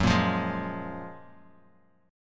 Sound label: Gb Major 9th Gb, Guitar Open G; chordshape; down